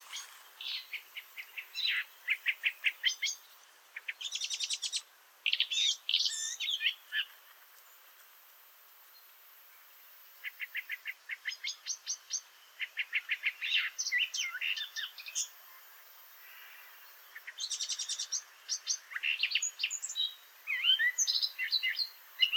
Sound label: call, Blackcap, subsong, full song with mimicry of Blackbird song; sylvia atricapilla; mimicry of Blackbird calls, from 15" to 33" subsong, from 33" to the end full song with mimicry of Blackbird song (beginning at 40")